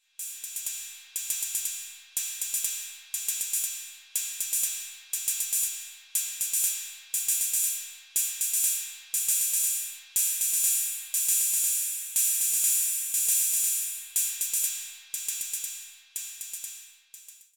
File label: Elektron Analog Rytm Ride Cymbal Hit Decay